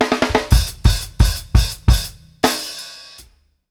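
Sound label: OPEN HAT UPBEAT R, Drumworks FILLS 84; Sampleheads; New York City Drumworks VOL-1; KD FILLS 84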